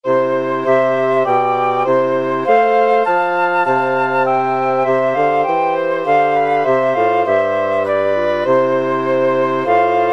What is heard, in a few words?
jesus christ is risen, wind quartet